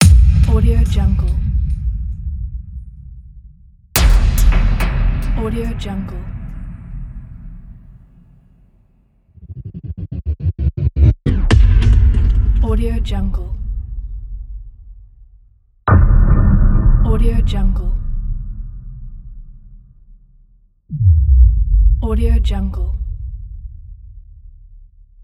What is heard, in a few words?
drum kick reverb pack